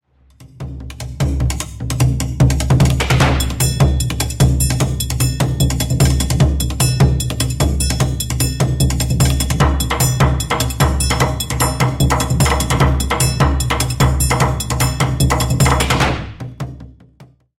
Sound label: non riverb